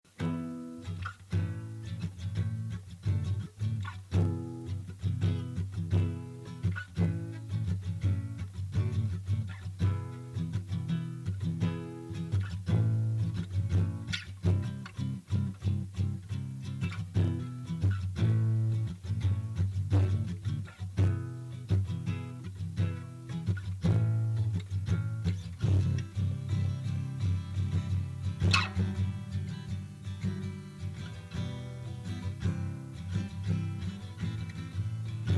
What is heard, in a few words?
Guitar Strum Acoustic